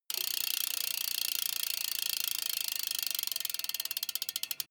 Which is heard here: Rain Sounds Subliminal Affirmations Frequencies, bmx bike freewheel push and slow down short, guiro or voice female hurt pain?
bmx bike freewheel push and slow down short